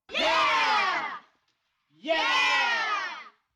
Kids Yelling Yeah

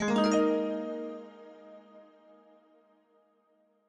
Success Jingle

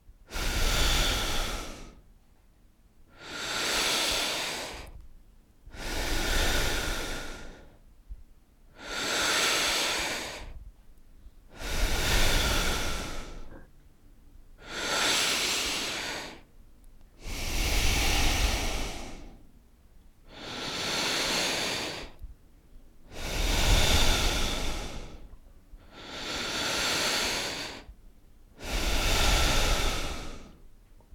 Respiration

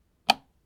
Light Switch Off

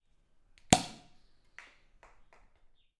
Popping Champagne

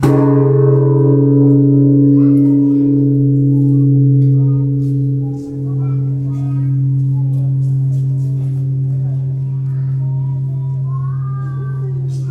Bonsh Bell Japan

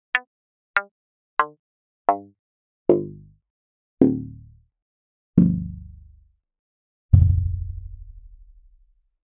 Synthetic Resonant Pluck Twang

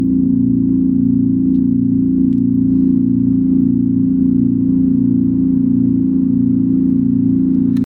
Drone

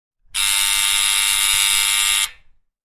Intercom

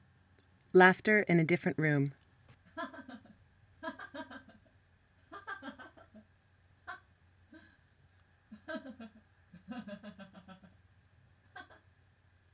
Laughter Quiet

